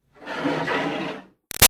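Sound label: Water Spray Interior Car By Hood Med; Car Wash; BigRoomSound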